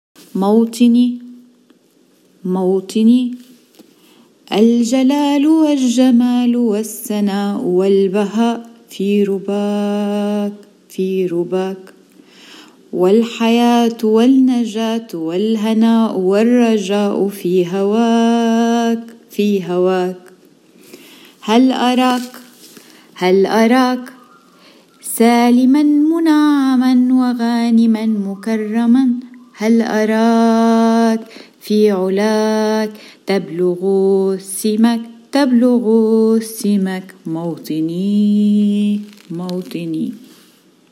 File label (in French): chanson arabe